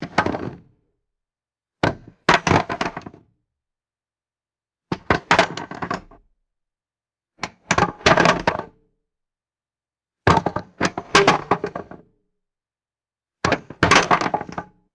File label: wooden planks dropping onto cement